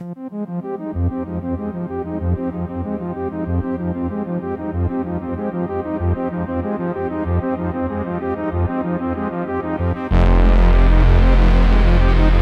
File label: a deep cosmic soundscape; silent starscape; sci-fi